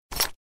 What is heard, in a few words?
use prop